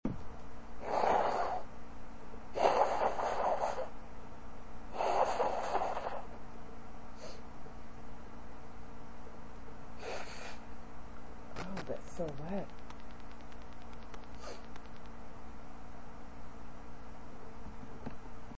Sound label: blowing nose from winter cold, female